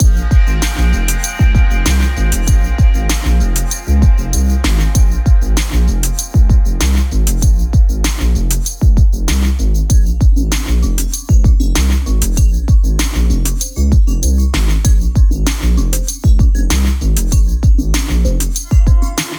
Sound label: Loop